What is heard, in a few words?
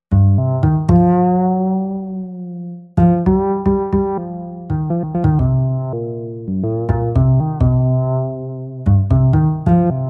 bass and brass evolution